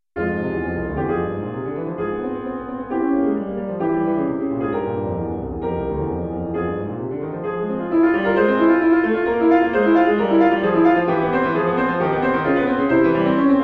etude pour piano; Johann Baptist Cramer

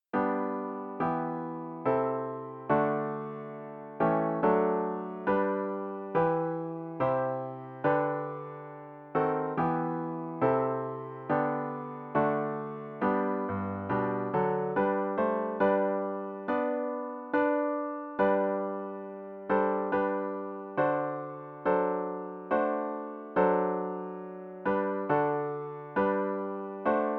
hymne national royaume uni

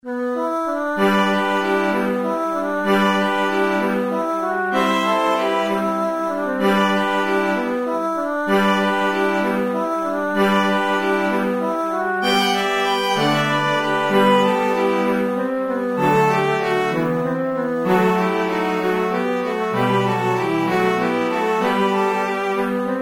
concerto pour flute orchestre cordes

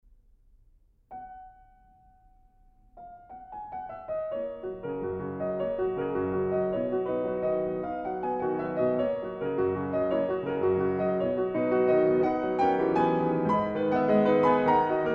prelude en si majeur vivace; chopin frederic

